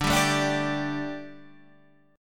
D Suspended 4th D, down, Guitar Standard; Dsus4 strummed; JGuitar

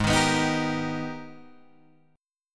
Minor Major 9th, Guitar, Open G; Gsharp

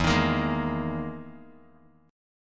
E-Major Sharp 11th E, Bass Standard; EM#11 strummed; 12,18,20,13; JGuitar

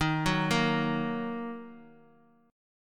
D sharp Minor D sharp; arpeggiated; JGuitar

